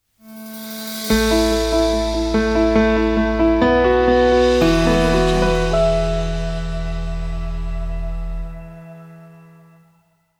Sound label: piano logo; collabhub